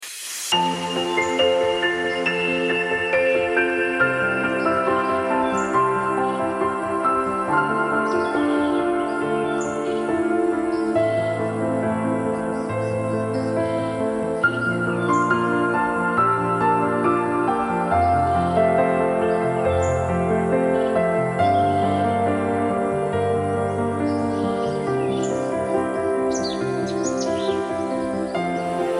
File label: Orchestral Mix